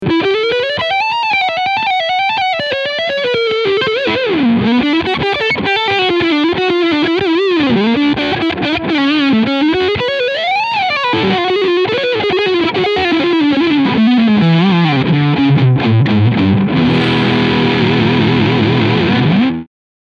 Traveler Guitar TR VAIBRANT Overdrive Lick Front Pickup